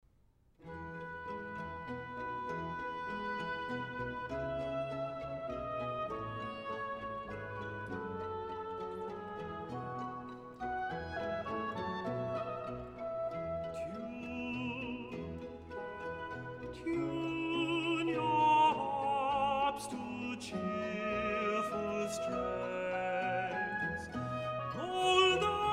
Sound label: Aria; George Frideric Handel; Esther 1718 version; Purim; 'Tune Your Harps To Cheerful Strains'